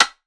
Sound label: perc stick; Drum One Shots; SD MIDNIGHT Sample Pack; Slate